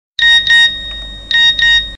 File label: beep beep; Hip Hop